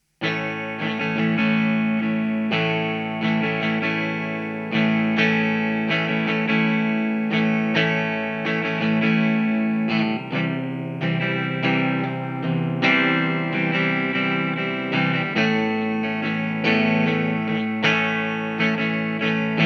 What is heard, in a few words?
ROLAND JC120